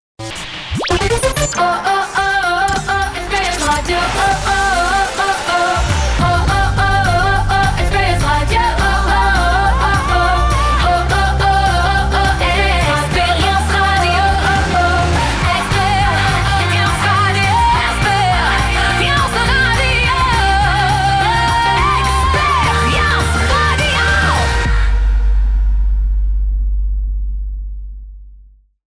Radio Jingle Chante Pop Rock

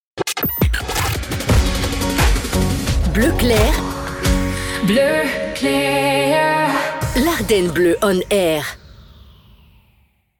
Jingle Chante Theme ID; BleuClair

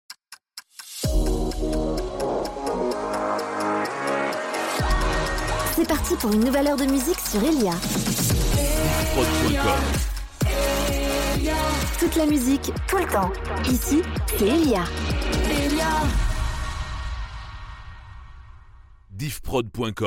Top H Chante; Helia Base

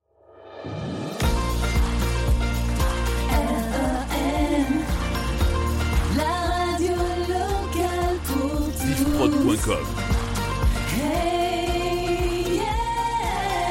Jingle Chante

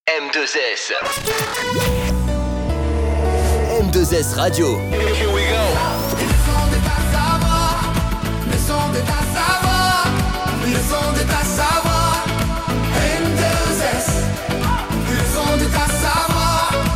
Jingle Chante; M2S

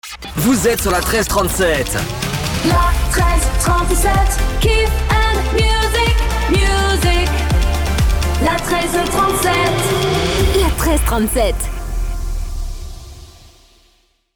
Top H Chante

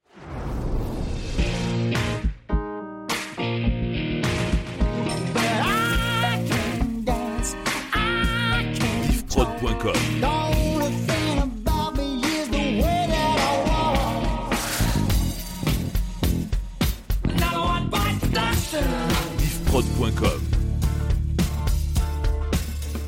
Medley Rock Vintage 105 BPM

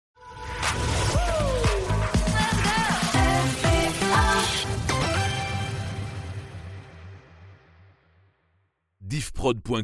Jingle Chante; RPA

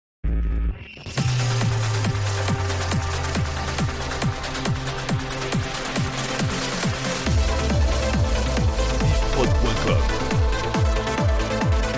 Medley Dance Trance

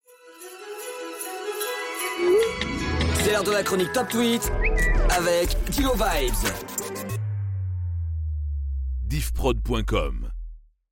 Jingle Chronique Top Tweet Noel

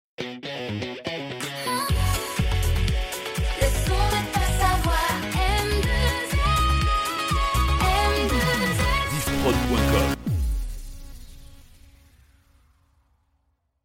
Jingle Chante; M2S

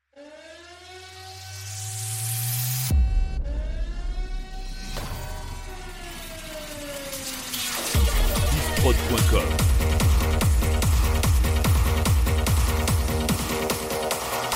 Medley Dance Hard

